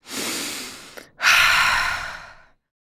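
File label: female breath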